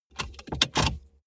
car bmw e46 interior safety belt clasp release hard